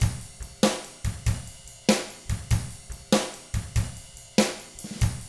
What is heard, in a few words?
rock 6/8, drums; ILIO; Double Platinum Drums 1